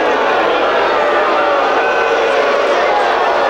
Baseball Crowd Boo Loop; Crowds

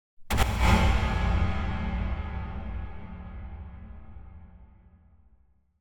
Impact Fatal Wooden Hit - Higher; Hollywood Edge; sound designer's toolkit v2

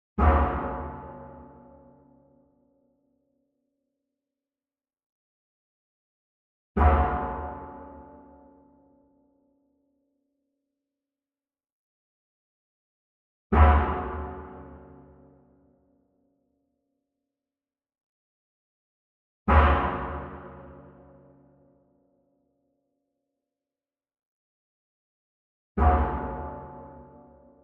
Metal Pan Hit Soft Beater Far; SWEETENER; boom library; Cinematic Strikes Construction Kit

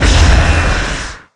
Rocket Launcher Shot; SFX Kit; Weapons

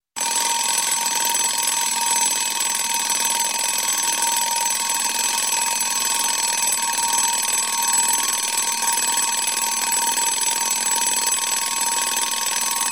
Clock, Alarm Wind Up Clock Alarm Bell Ringing; Sound Ideas 2000 Series

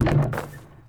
Street Ball Glass Basket

